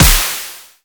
Snare, Dubstep One-Shots Drums

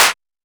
Clap, Techno; One Shots; GhostHack; Ghosthack - Free Techno Sounds 2024

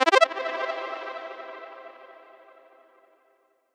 Power Up; Cymatics; Light Free Sample Pack

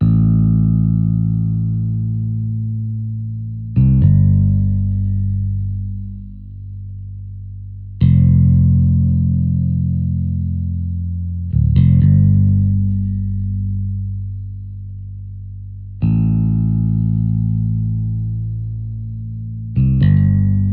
Fret Bass - Am 60Bpm; Construction Kits; Ghosthack; SkyRealm; When The Bell Tolls

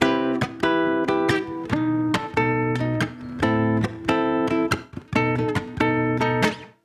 Guitar Loop - 140 BPM E Min, Dry; Melodics; Instrument Loops; Guitars; Cymatics; Cobra Hip Hop Sample Pack

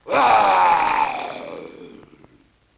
LoFi Synthetic Lion Roar; Vintage Cartoon; Comedy Vocals

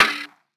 Percussion, Hip Hop, Drum One Shots

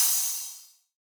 Crash, Cymbals; Cymatics; Dubstep Starter Pack; Drums - One Shots

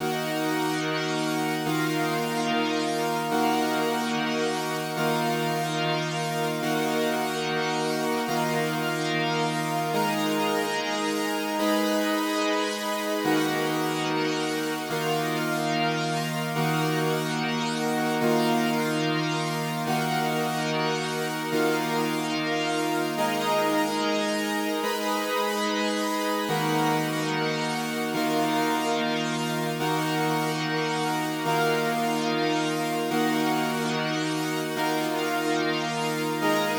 145 BPM D# Min Chords, Analog; Melodies; Loop Stems; Cymatics; GHOST (Beta Pack); Emerald

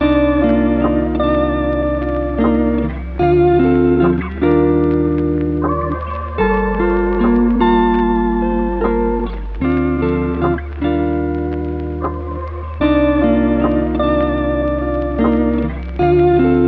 75 BPM G Min, Lofi; Melody Loops; Pistachio; Cymatics; ANALOG EVOLUTION Beta; Lofi Collection

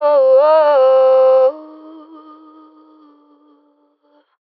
Ad Lib Dry - C Min, vocals; Cymatics - Eternity Sample Pack; Ad Libs

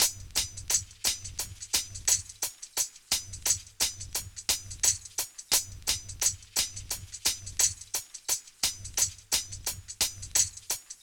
Drum Loop - 87 BPM Hihat, Boom Bap; Frozen; Cymatics - ANALOG EVOLUTION Beta

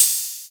Open Hihat; Drum One Shots; Cymbals

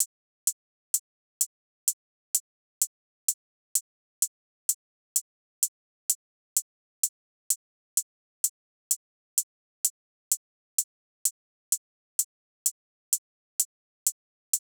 Drum Loop - 128 BPM Hihat, Experimental; Drum Loops; Loop Stems; Cymatics - GHOST (Beta Pack); Abnormal Drum Loop

